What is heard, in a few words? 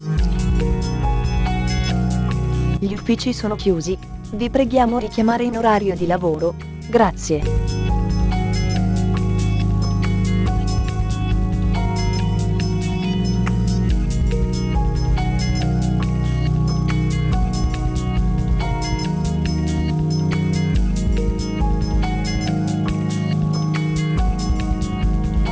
Notte Ambient